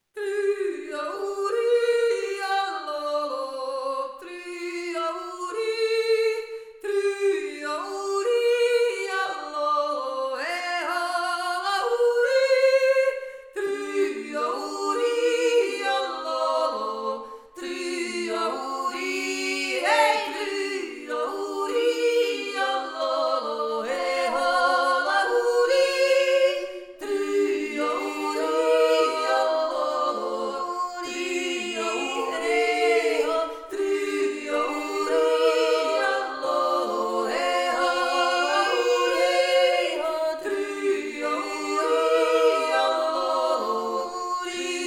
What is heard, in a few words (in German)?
3stimmig